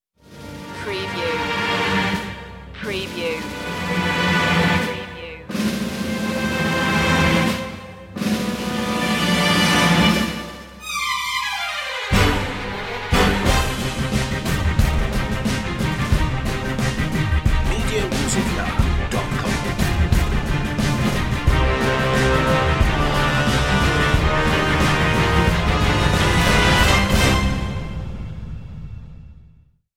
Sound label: no choir